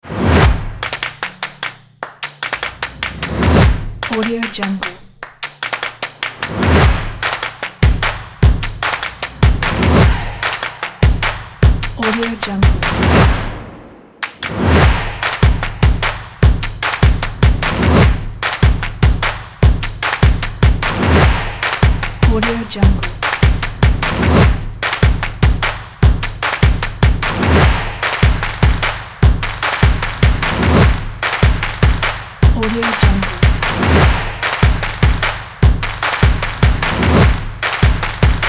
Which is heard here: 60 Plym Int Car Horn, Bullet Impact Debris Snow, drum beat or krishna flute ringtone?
drum beat